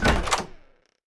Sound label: Door Handle